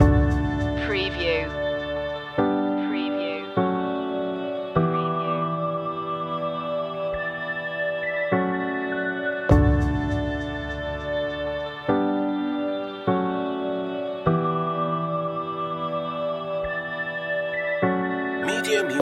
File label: will it rain loop